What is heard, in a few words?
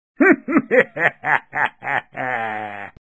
HAHAHAH; Haloween; Rosegarden